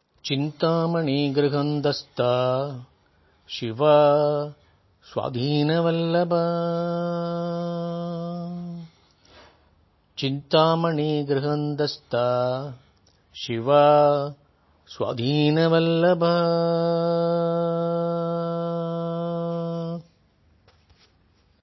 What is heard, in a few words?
Chintamani Mantra